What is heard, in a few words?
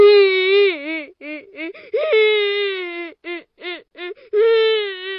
banana cat crying